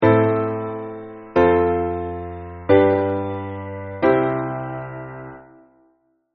chord progression

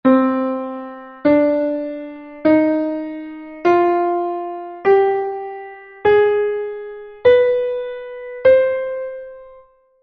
C harmonic minor scale

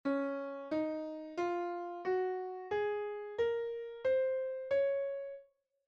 Db major scale

